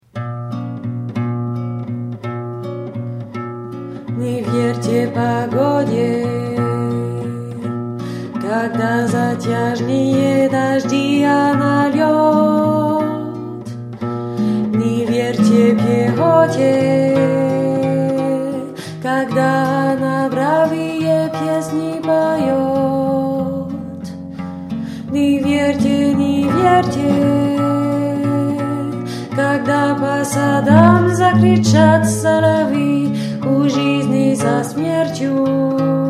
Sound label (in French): basse; Pesenka